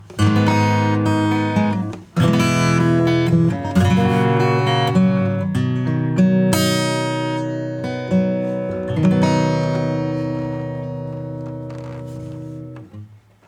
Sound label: acoustic; Material comp